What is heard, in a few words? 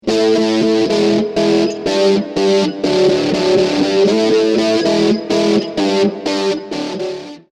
v bass dist gtr